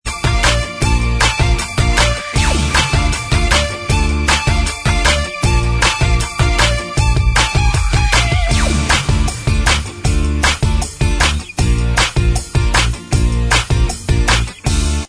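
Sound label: instrumental, backing track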